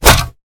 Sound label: enemy Attack